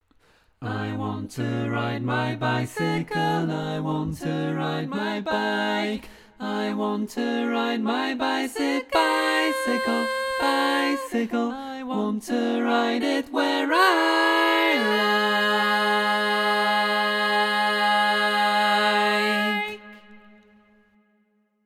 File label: Bass, barbershop